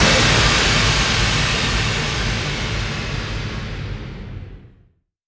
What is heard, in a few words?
FX Impact; Vengeance Samples